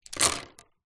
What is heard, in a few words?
office crayons many set down on wood